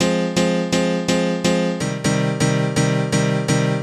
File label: House Piano (125BPM), retro house